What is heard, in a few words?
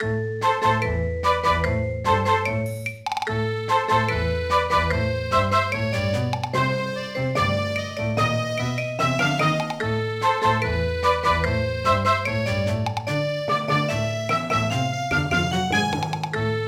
Music Mini Game Tension; asterix these romans are crazy 2009 nds gamerip